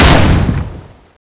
fire, weapons; cso; turbulent11

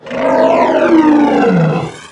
sight, doom3 pinky

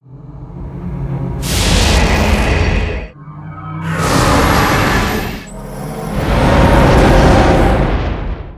attack

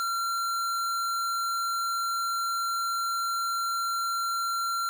charge loop

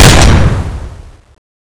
fire; cso; x-12

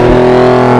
v8 elite rev down

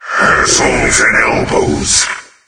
taunt; doom3; sabaoth

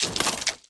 armor

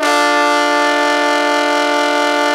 truck horn

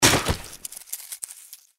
bodyfall; npc; cazadore

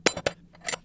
toolbox; snds jack gmod

